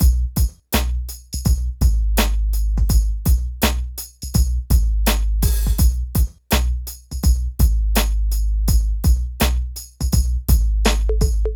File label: PHATT BEA